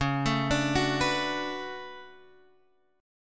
D Major 13th C sharp, chord

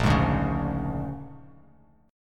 Bb 7th Sharp 9th Bb, Bass Standard; Bb7#9; strummed; JGuitar

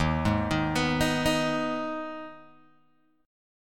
Eb Suspended 4th Sharp 5th, Guitar, Open G

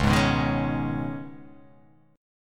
C sharp 7th Suspended 2nd; down; chord shape

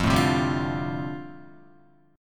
F 6th Add 9th, Guitar, F6add9 chord; F6add9 strummed

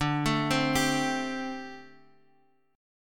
A Minor 7th D; arpeggiated

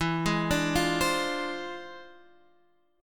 E 7th Flat 9th Sharp 5th; E7b9#5; arpeggiated

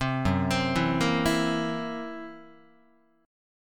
Gb 7th Flat 5th down, Guitar; arpeggiated; JGuitar

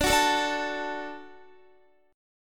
D Major, D, down, Guitar; strummed; 4E,4A,5D,5G,5C,6F; chord

